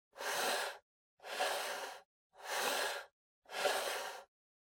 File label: PC Mouse Move Wood Long